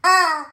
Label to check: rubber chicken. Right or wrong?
Right